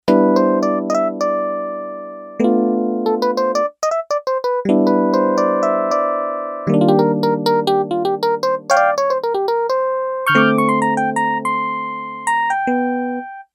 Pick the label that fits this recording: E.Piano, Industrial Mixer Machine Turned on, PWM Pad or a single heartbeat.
E.Piano